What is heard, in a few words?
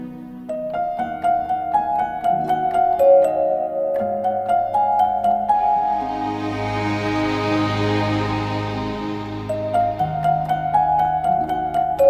Painful End BGM